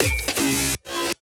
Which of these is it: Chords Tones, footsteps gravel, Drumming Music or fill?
fill